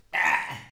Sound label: argh